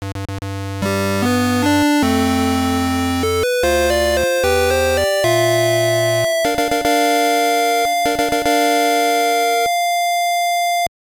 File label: Jingle; Grandia Parallel Trippers; GBC